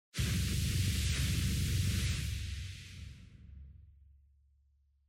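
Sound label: Compressor air out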